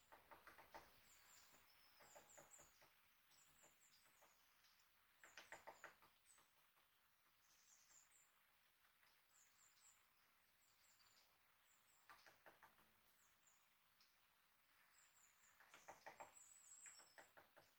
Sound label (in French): martelement pic epeiche recherche nourriture